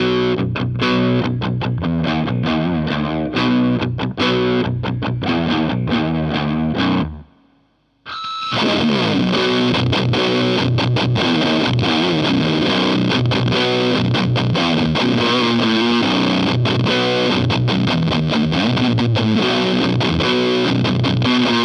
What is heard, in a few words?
Fuzz; Boss OD 200